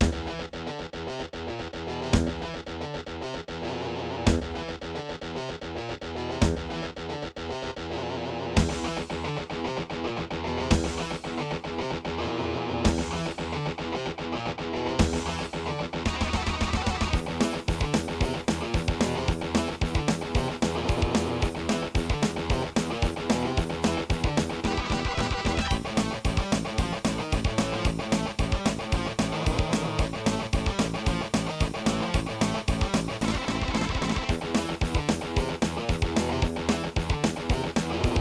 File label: korg ag10; soundcard test; Doom